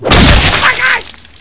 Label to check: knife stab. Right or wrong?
Right